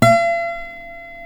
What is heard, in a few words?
F#5 MARTIN 6, Guitars; Spectrasonics; Hans Zimmer Guitars VOL-1